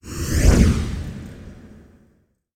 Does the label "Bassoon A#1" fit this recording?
No